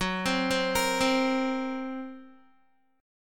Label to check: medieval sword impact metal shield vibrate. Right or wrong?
Wrong